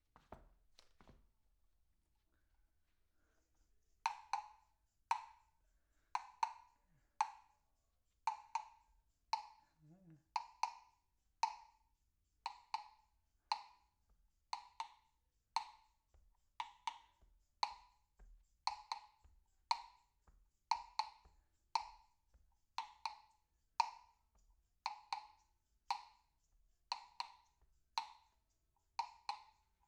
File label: PERC OH M